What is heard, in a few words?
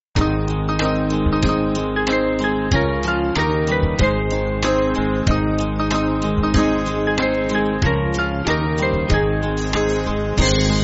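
Band, Music Backing Files for Churches; Hymnary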